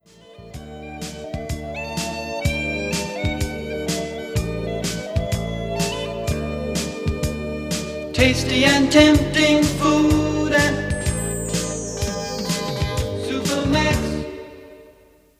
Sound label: Jingle; Supermacs